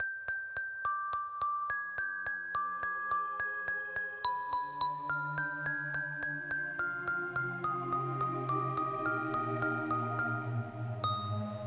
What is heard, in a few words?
Game Over; Friday Night Funkin Funkoriki ost windows gamerip 2023